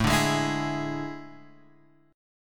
A Major 7th Suspended 4th, Guitar, Open G

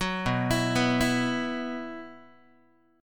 B Suspended 4th, Guitar

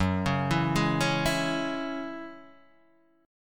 Gb 7th Suspended 2nd Gb Guitar Open D

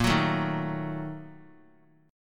A sharp Major 7th Suspended 4th Sharp 5th; strummed

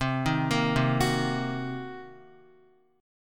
C Minor 7th Flat 5th, Guitar; arpeggiated; chordshape

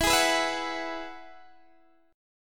E Major Add 9th E, Guitar, DADGAD

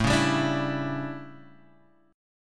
A 11th down Guitar Open F, chordshape; strummed

